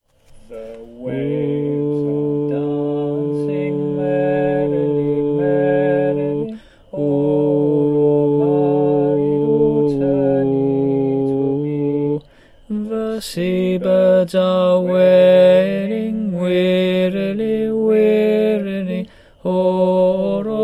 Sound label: alto with bass